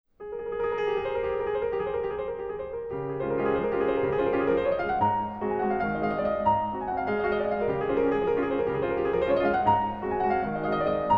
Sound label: Waltz in D flat major; chopin